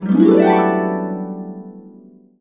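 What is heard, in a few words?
D major, Harps; USB Soundscan vol.62; AKAI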